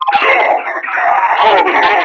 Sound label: Enemy Death; Warframe; Lasrian; Battle Barks